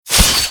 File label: impact blade